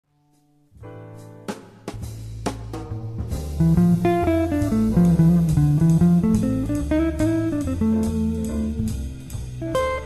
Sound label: improvisation